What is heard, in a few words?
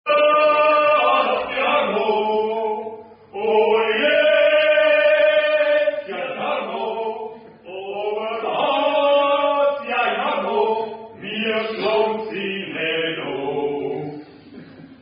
Die Kuah Hot In Orm O, German Drinking Song; Cantus Best Graz